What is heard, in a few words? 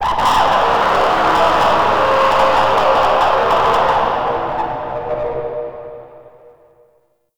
Resonating Didgeridoo; Warframe; Shadowgrapher